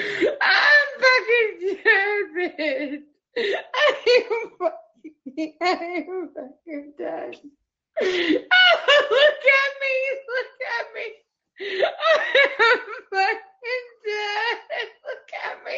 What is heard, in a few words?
dead laugh